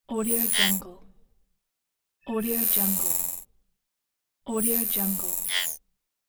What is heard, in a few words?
futuristic device scan; aetherfx